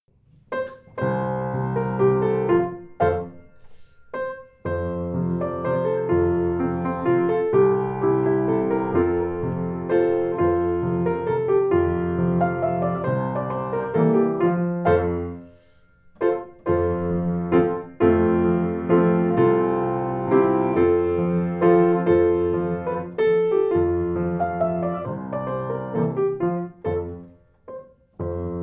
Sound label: piano